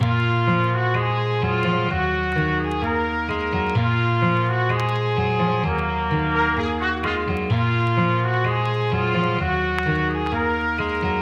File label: Melody Loop 128 BPM A# Min, Hip Hop; Cymatics - 9God Hip Hop Sample Pack